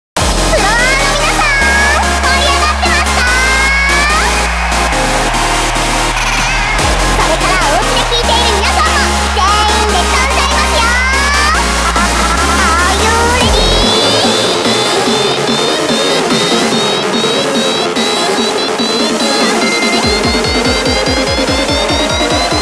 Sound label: EDM; DDR 2014